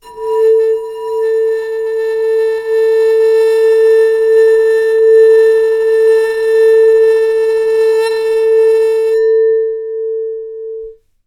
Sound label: Bowed, Large Wine Glass